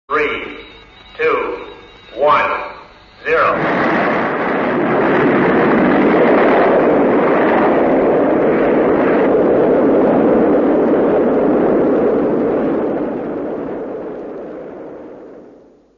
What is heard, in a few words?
countdown